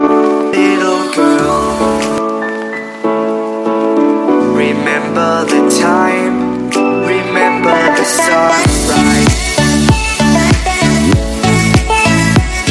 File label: Lullaby